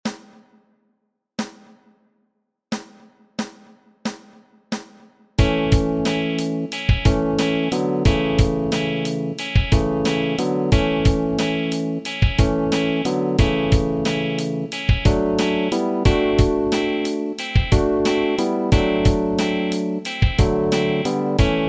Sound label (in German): ohne Bass